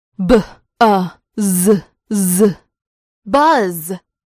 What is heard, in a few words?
buzz